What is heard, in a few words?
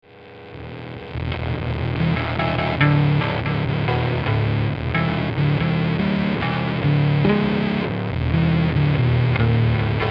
bass